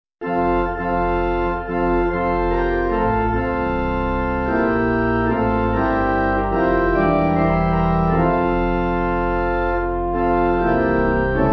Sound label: Pipe; O What Can Little Hands